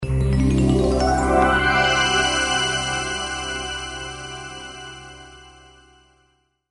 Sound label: Cyder Dream Alert